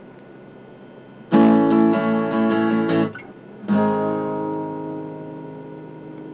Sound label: guitar